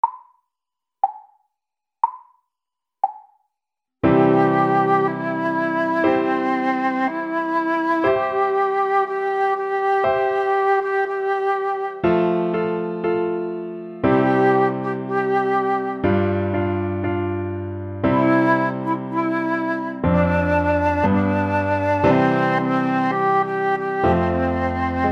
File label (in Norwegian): Trommelspiller